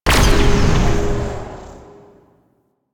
Swarm Scanner Deploy Wave; Warframe; Entrati

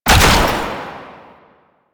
Ranged Weapon Fire; Warframe; Necramech

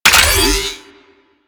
Mod Pickup; UI; Pickups; Warframe

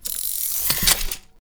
Sticky Bomb Reload Ready, Weapons; Grineer; Veilbreaker

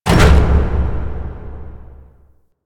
Star Base Blast Door Close; Warframe; Doors

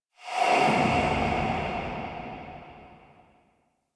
Amb Start Whoosh; Warframe; Ambience; Lore

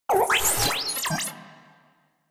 Tank Airburst Chirp Spawn; Enemies; Weapons; Warframe; Lasrian

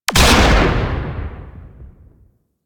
Modular Secondary Barrel Fire, Weapons; Warframe; SU Modular Guns

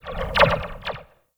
Zipline Random Slow; Warframe

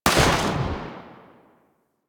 Pistol Shot C; Sybaris

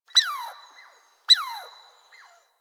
Eidolon Zone Tree Critters, Ambience Plains; Warframe

